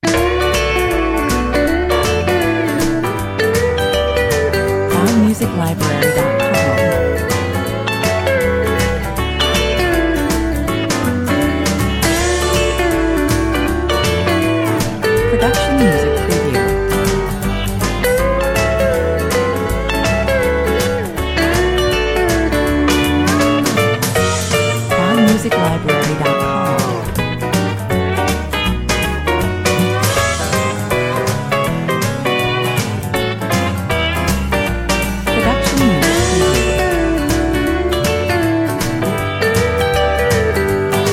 Bright and uptempo, authentic country swing with electric and steel guitars, 160 bpm